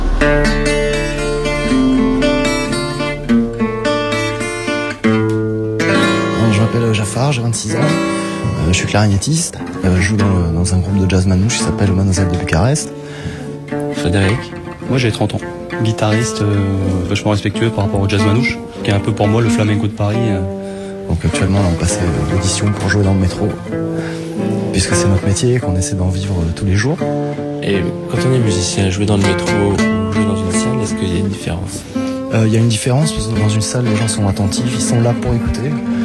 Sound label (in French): Musiciens du metro groupe jazz manouche